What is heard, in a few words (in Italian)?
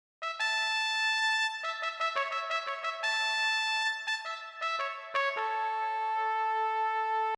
tromba